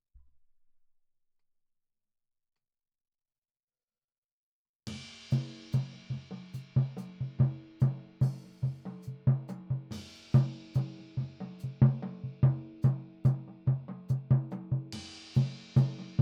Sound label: strange home floor tom, drums; film